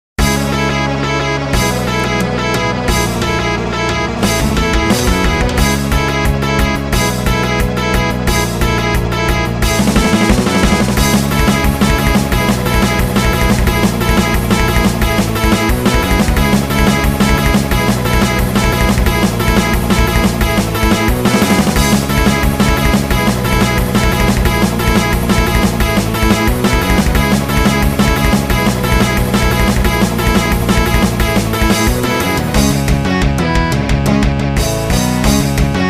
Rock; winter memories windows gamerip 2024; Game Maoudamashii 7 Rock46

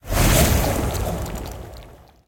Coprs Tousse FX impulse

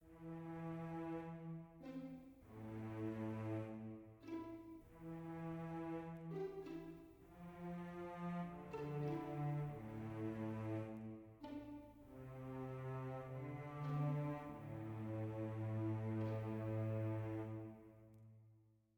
mysterious soft; Quivr; PS4 Windows gamerip 2016

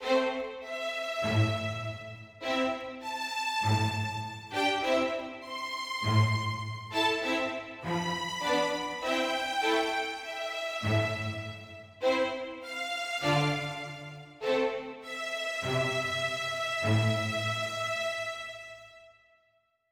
mysterious forte

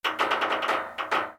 Creak Metal Long

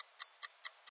Timer

